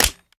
plr sm beta reload empty mag in; gmod

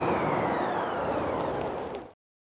throttle off slow, vehicles; cybertruck

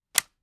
ar selector off; gmod; viper

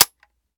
weap fire first; gmod; weapons

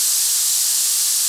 tire composite looped; gmod; svmod

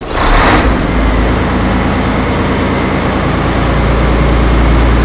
first gear rev loop, garbage truck; gmod; sligwolf

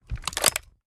selector off, weapons; viper

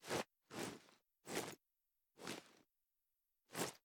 reload empty cloth; reloads; gmod

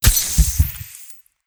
heal; gmod; viper

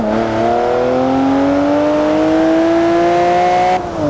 second, vehicles; bmw1m

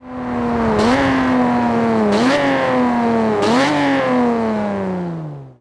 throttle off, vehicles; gmod; tdmcars; fer 250 gto

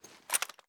kilo98 inspect; viper

